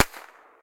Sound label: pop; World Of Goo; Fuse